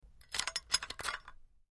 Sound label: Locking A Steering Wheel Lock; The Club Lock